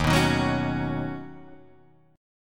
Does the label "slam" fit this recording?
No